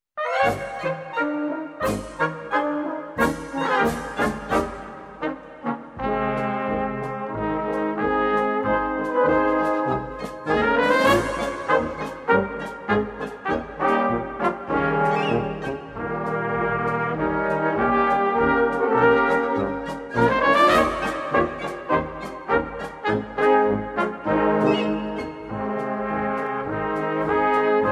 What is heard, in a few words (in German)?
polka